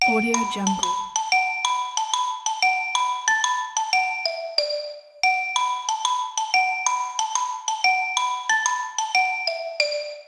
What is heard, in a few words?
playtime